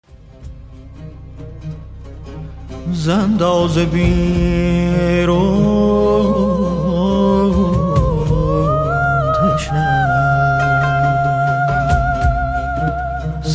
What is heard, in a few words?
Vocals